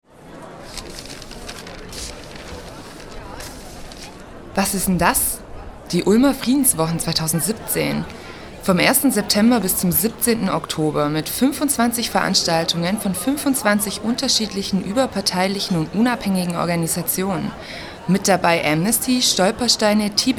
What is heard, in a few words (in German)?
jingle; friedenswochen